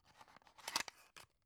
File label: drug open soft; berufe; arzt